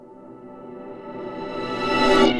Reverse Fx; musicradar

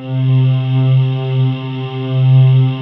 NEW AGE VOX, JV PADS; Pro-Rec - Synthsonic

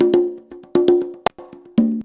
CONGA 119, Modern Percussion Loops

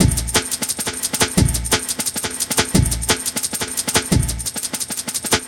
Classic Remastered Jungle Breaks, A#, 175

